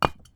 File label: brick step; dfcaverns; hunter statue